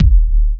drum kit dnb

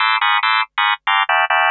TECHNO SYNTH 140; Keys

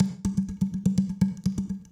bottle, ethno, 125

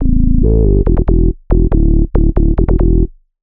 ELECTRO BASS 140 A

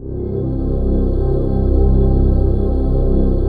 PAD; PADS 2

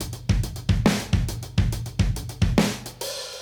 Modern Breaks; Touch Loops; ClassicBreakSessions

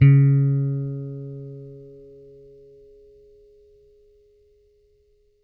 FINGER C#3, Bass; Good 80 Finger; Bass Mace; DirectWave

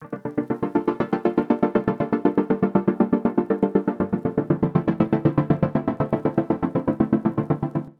120 Cm7 stutters, Music Loops; Touch Loops; BlissedOutBeats

